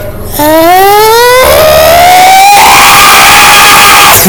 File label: yahhhhhhhh loud